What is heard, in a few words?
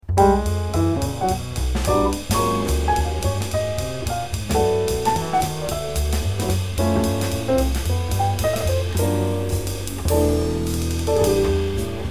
trio